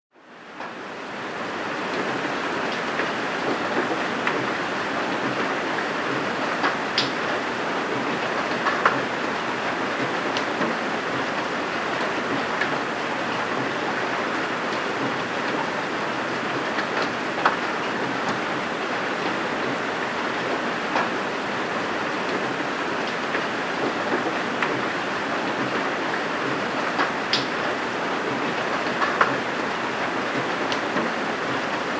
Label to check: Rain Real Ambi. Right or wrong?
Right